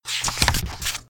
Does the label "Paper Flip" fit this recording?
Yes